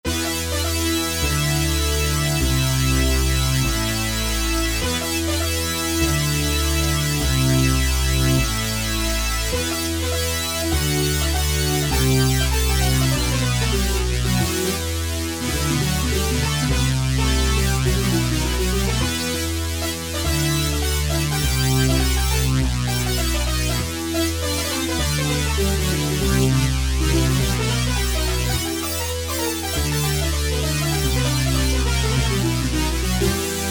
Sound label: Moog, E-mu Proteus VX